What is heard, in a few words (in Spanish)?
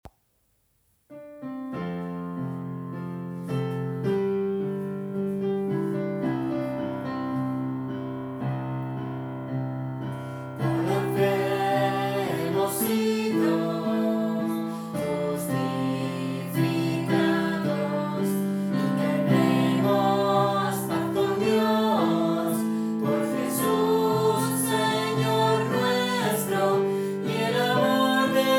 piano